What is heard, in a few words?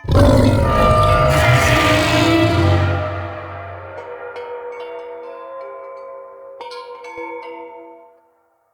Corrupted Taunt Scream; Vocals; Bromius; Animals